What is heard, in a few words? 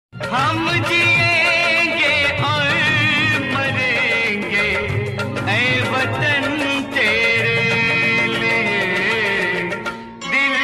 Desh Bhakti Song Ringtone